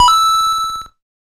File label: Super Mario Bros. Sound Effects Coin; Super Mario History 1985-2010